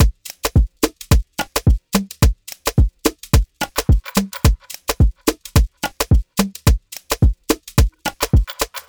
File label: simple afro drums loop 108bpm D minor